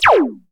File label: DANCE ZAP